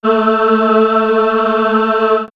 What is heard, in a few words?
Swirls Cartoon Choir, pads; X-Static Goldmine